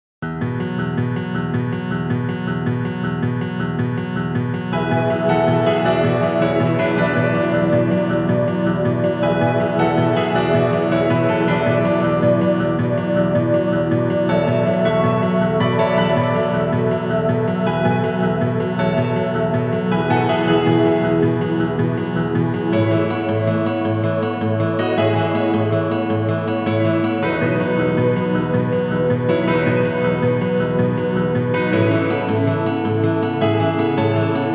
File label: Chiptune